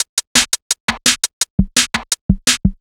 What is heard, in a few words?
Funky Beat, Drum N Bass